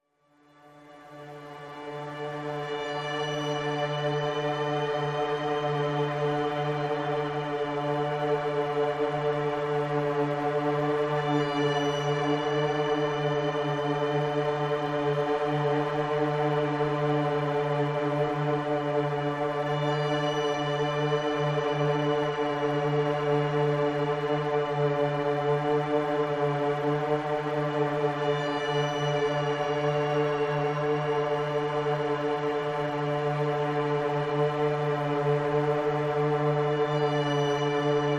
Haunting Synths Only

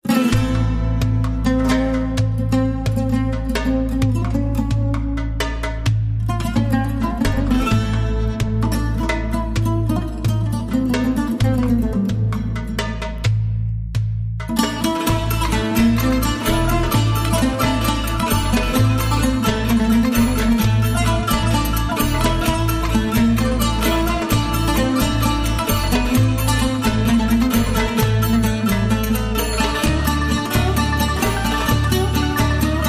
Armenian Belly Dance

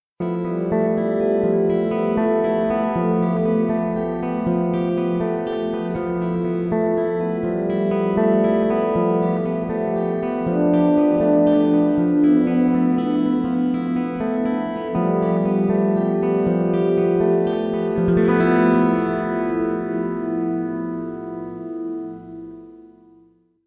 Ice Guitar

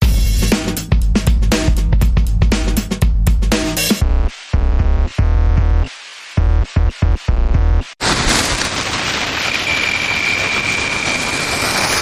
Trash Daze Dance Bumper Reversed

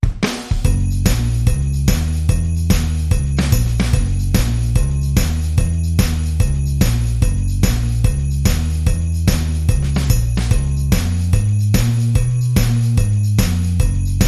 Drum and Bass

